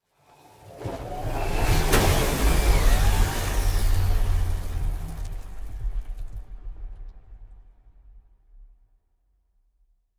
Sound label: ship transport ship move; Stellaris; machineage